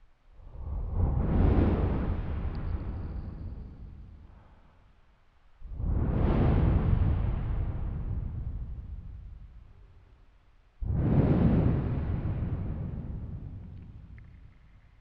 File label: Swoosh